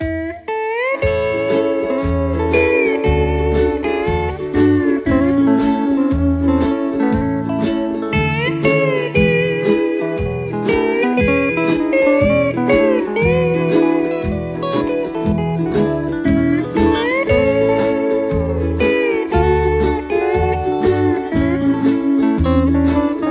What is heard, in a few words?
Aloha Oe Instrumental